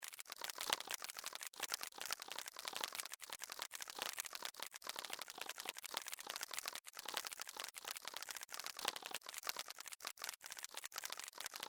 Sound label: Created ambience mechanical loop; Various Ambience created through sound manipulation in FMOD